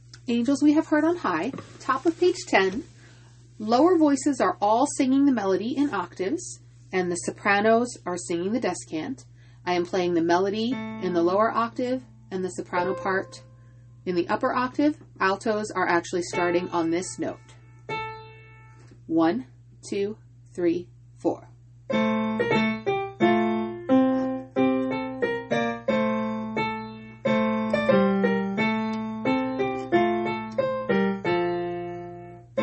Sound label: angels concerto satb; study tracks